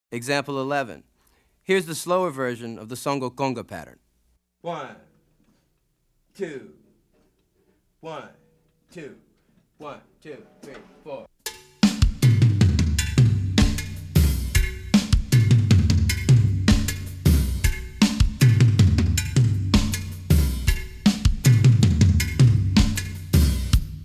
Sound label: Afro-Cuban Grooves For Bass And Drums